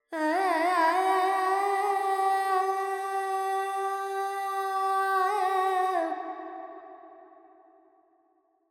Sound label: Carnatic Female Alaps, Panthuvarali, 100 G, Verb; Sample Banks